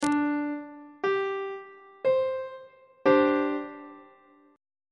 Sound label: d double flat suspended 2nd 1st inversion on treble clef